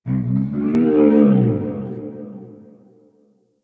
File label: Forest Distant Creature Medium Cry; Ambience; Warframe; Grineer Forest